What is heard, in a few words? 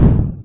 alien hit, video games; pulsar